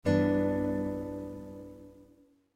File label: g 6 open chord